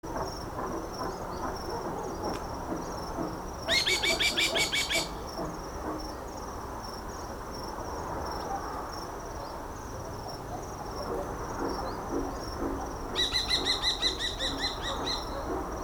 kabasólyom hangja

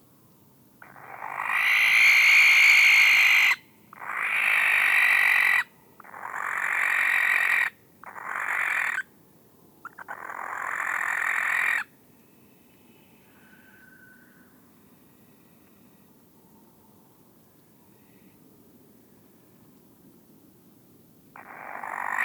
gyöngybagoly hangja

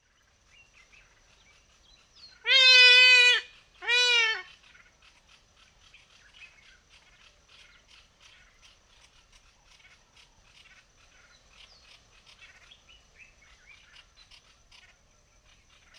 trombitás szarvascsőrű hangja

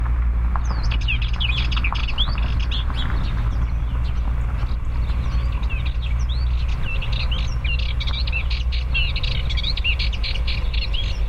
kucsmas poszata hangja

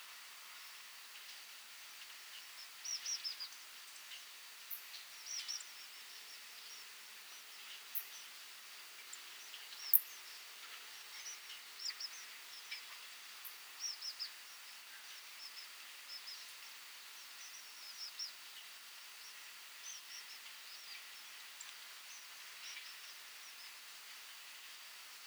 erdei pityer hangja

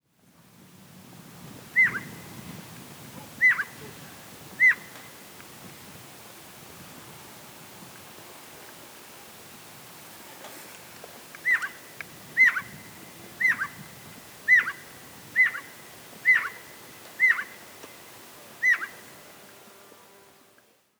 szurke szajko hangja

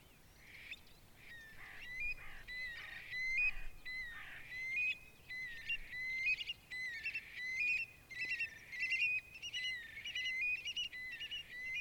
ugartyuk hangja ugartyuk Burhinus oedicnemus

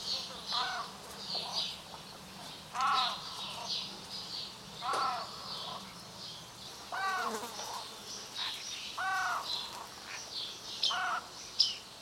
bubos vocsok hangja

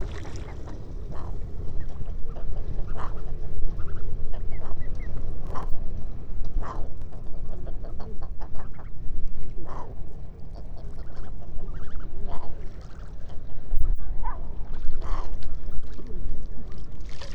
pehelyrece hangja

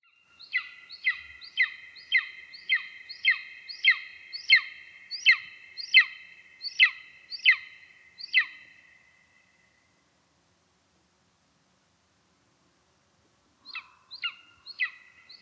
szirti sas hangja

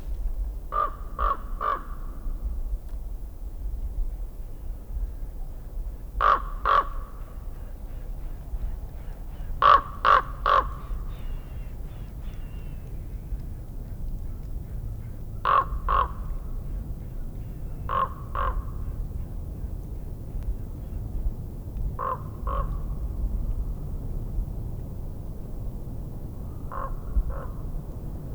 hollo hangja

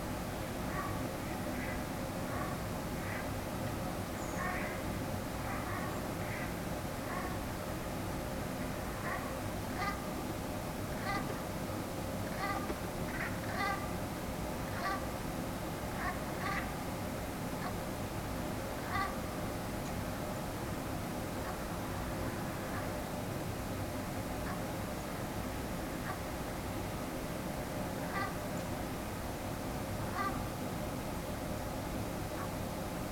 orvos lud hangja

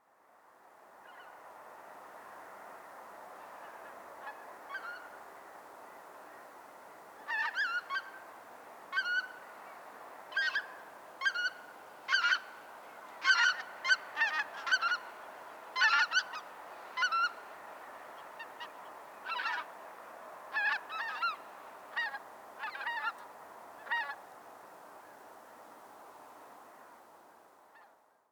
nagy lilik hangja

